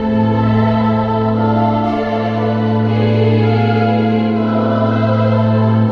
voice ghastly choir, Loops, Sad

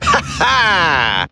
ha ha

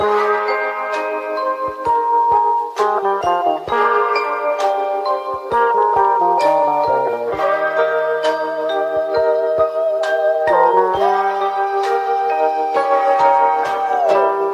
keys, phonky loops

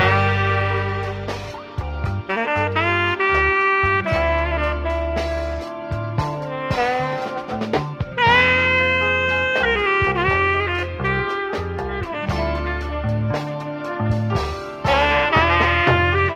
sax, phonky loops

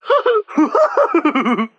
laugh silly; ooh ahh

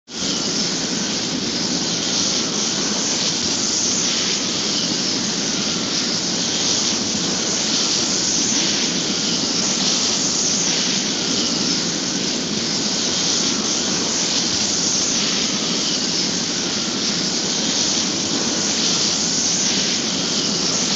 wind; Rumbles

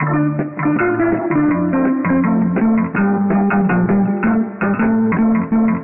guitar, Loops; Phonky

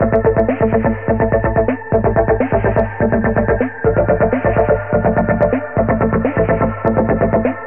phone numbers, loops electronic

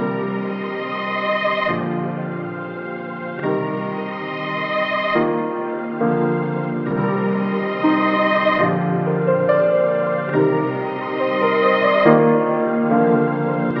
piano strings, Sad; loops

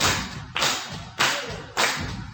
stomp and clap, drum loops

